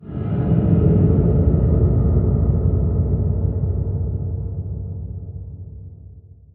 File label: Ambient